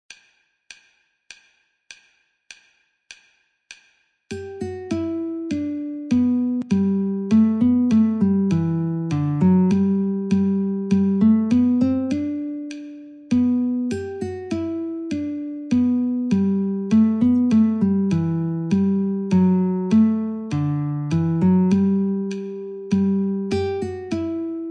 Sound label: Guitar